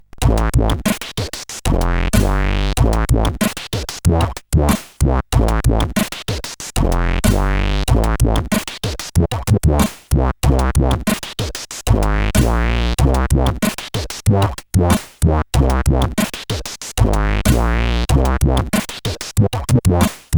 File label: Rap, sid CSG8580R5; FBI or Die Rap